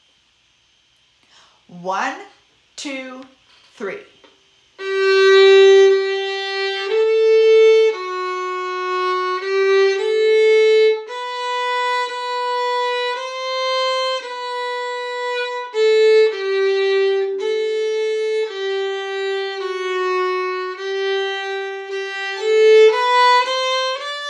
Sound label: My Country Violin; My Country Tis of Thee Violin 1